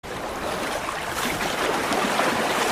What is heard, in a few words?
flowing water